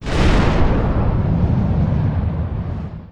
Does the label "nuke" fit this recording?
Yes